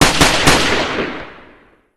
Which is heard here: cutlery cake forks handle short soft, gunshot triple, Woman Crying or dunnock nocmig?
gunshot triple